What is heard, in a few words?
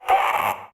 Vocal Pain; Warframe; PriestQuest; PossessedRedVeil